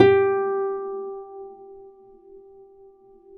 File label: G3 HARP; Complete Harps; USB Soundscan vol.62; AKAI